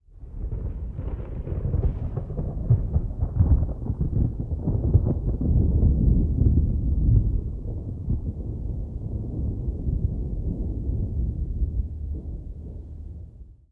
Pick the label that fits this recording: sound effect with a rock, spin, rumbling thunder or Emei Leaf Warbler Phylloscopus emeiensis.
rumbling thunder